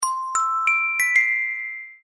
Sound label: Whistler; Tokopedia